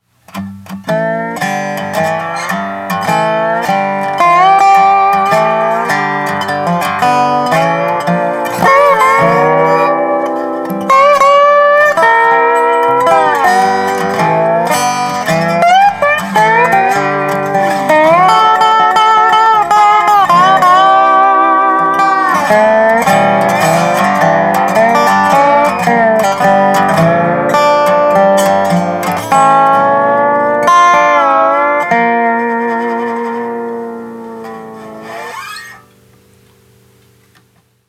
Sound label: Dobro FX